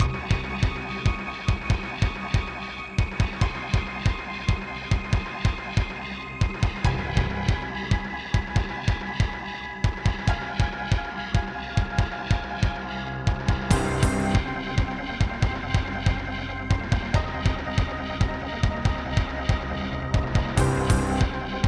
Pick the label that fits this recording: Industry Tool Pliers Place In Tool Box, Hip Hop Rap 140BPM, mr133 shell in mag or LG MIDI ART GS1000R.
LG MIDI ART GS1000R